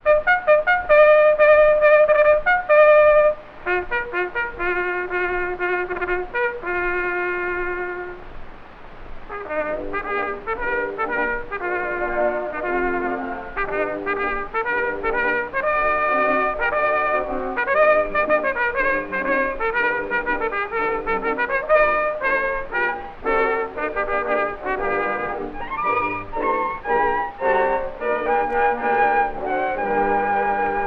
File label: trumpet solo with orchestra, saxophone